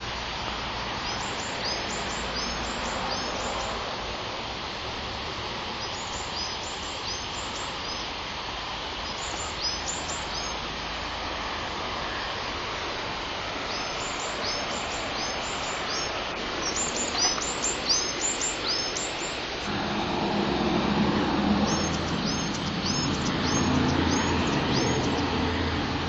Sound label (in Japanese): yamagara